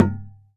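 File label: Percussion Tabla; Polyend; Simulacrum Sounds; 1 Shots; Acoustic and Electric; Drums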